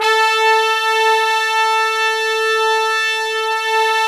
BRASS SECTN, Pop & Funk Brass

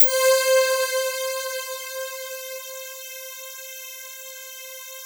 C5, Synth Line; 90 s Sample CDs; NiceBeats; SynthLine VOL-31

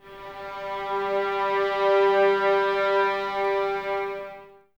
VNS G 3, Violins; Miroslav Vitous; String Ensembles

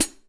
OHH, REAL DRUMS, SWING KIT; Best Service ProSamples vol.43; Real Drum Kits

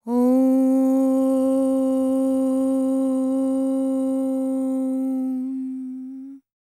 World Voices, INDIA; ILIO; Vocal Planet

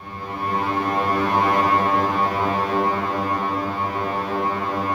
SLOW STRINGS

